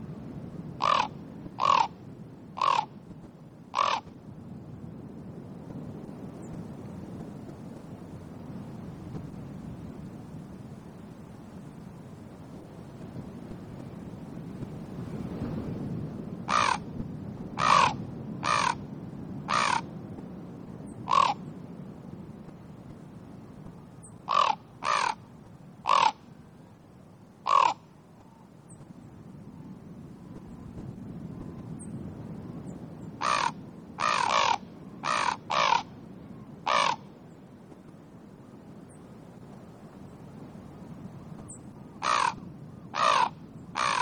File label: Common Raven Calls With American Tree Sparrow in Background; alaska acoustic highlights; BIRDS